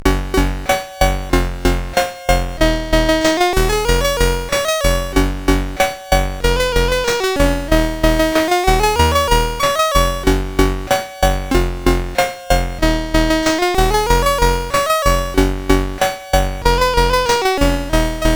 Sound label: Soundwave Tubular Bells